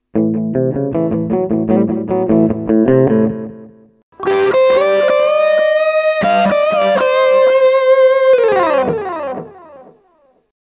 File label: delay